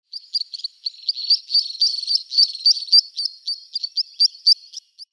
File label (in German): Dreiband-Regenpfeifer Charadrius tricollaris; Vogelstimmen